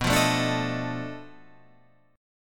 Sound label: B 7th Sharp 9th Flat 5th, down